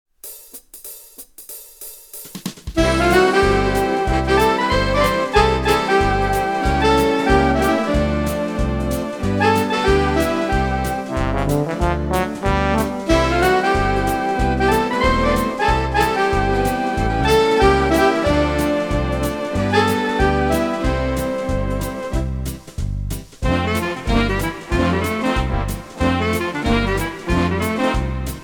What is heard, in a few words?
Brassbands; VSP II Brassbandbeispiele; VIVA MUSICA Fachhandel